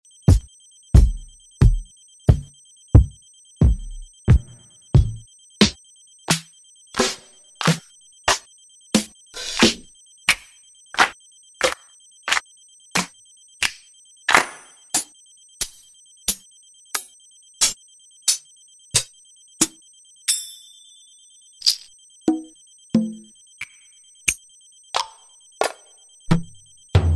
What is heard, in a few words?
infantry drums